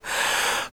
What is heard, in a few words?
FEM PERC, Vocal Jazz FX